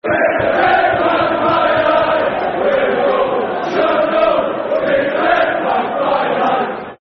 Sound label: Flying High, Up In The Sky, Football Chant (Soccer Song); Sunderland; Sunderland Safc